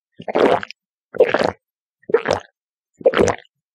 qolop qolop; قلوپ قلوپ

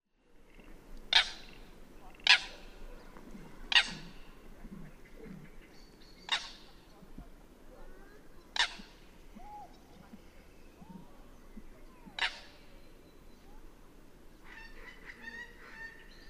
Tasmanian nativehen

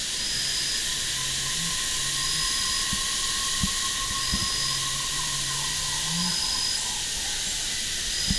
Sira curassow, پرنده کاکل فری سیرا

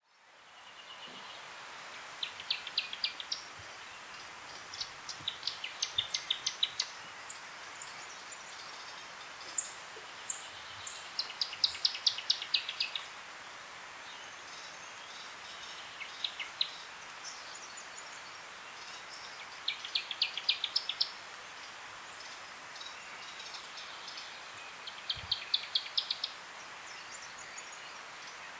Newtonia, پرنده نوآوا

